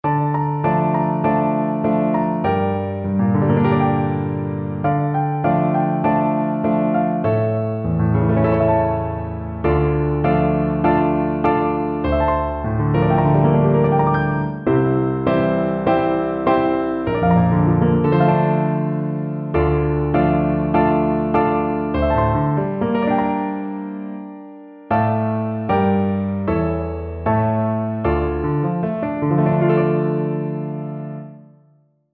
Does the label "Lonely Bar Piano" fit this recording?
Yes